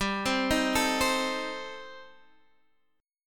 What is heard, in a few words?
C Minor 6th G, chord